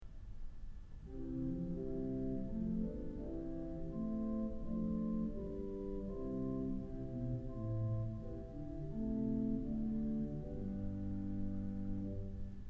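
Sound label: Great Erzahler; Kellogg Auditorium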